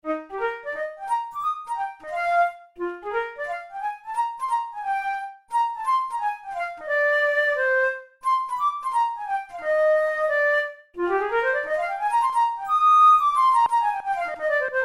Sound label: studies for the boehm flute; drouet 72